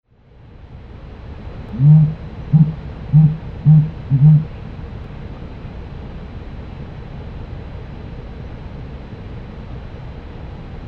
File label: Dusky Grouse